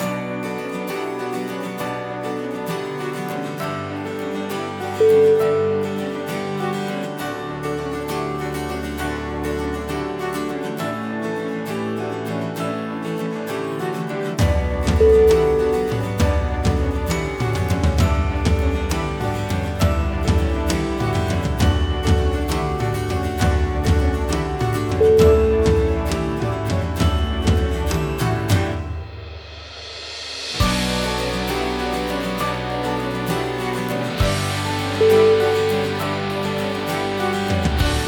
dramatic cinematic

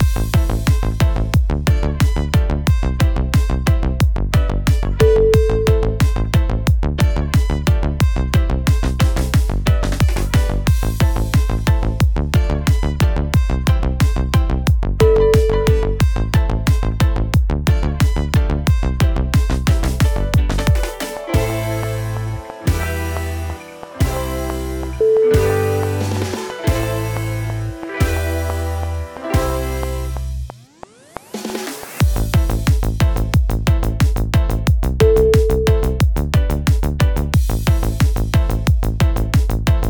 reggae

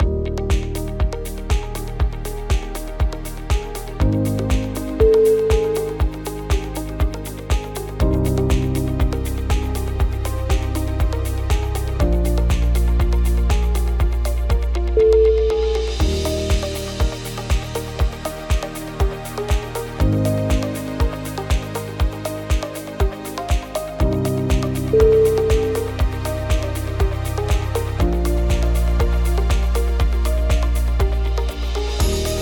successful corporate

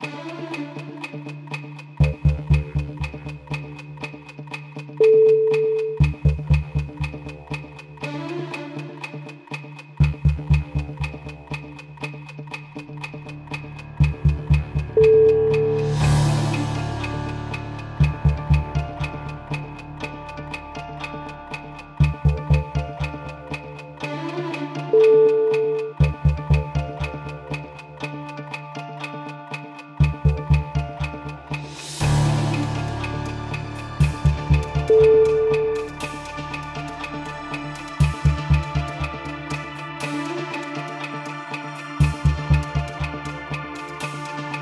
countdown tension

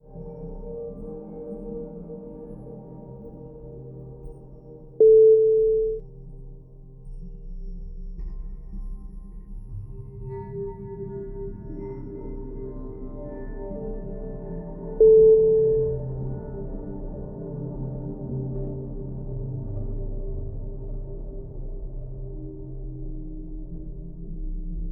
ambient lost soundscape

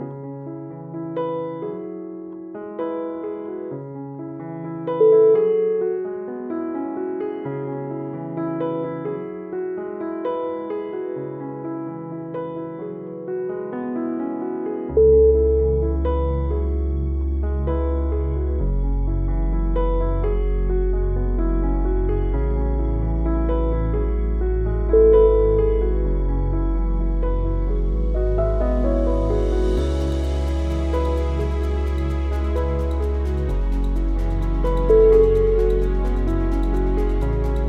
thoughtful documentary

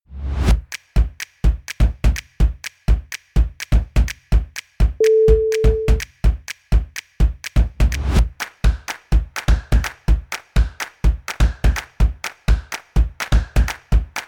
percussion stomps and claps

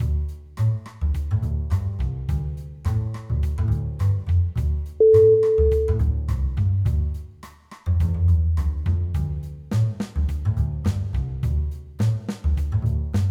jazz night

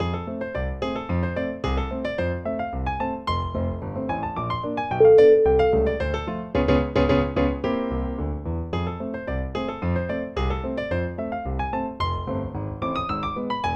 silent movie ragtime

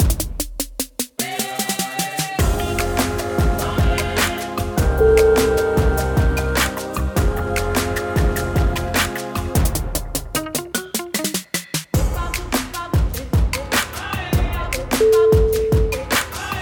african hip hop

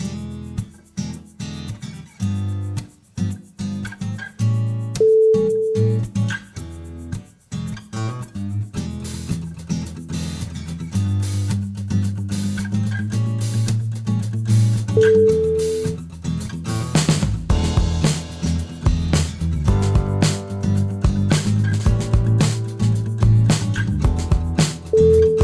twist and funk

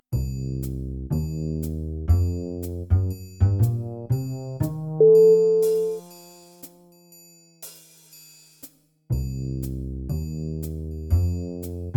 mystery bass

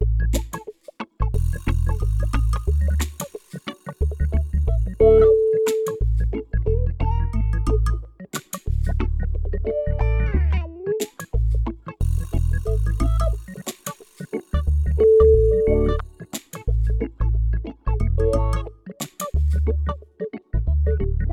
rasta man groove

